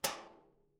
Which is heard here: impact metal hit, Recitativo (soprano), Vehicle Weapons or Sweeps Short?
impact metal hit